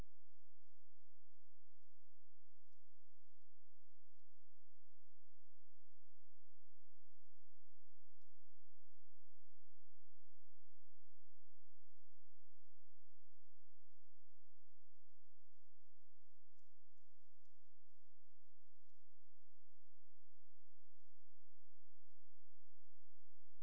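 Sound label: hydrophone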